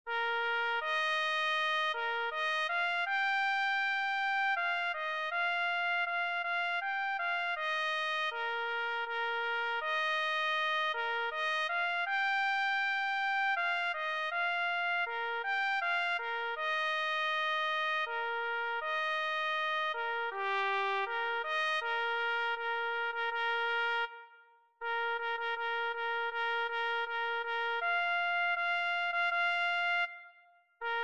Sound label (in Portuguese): VITORIA clarim